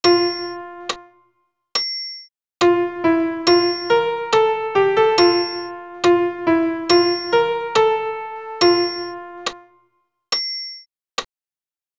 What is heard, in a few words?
Gloria Amen alt pian seul